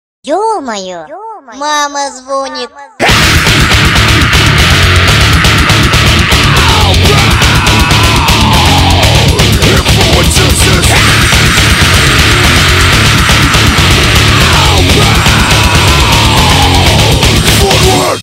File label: rock